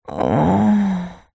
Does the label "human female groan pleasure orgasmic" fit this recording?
Yes